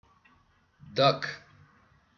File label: Duck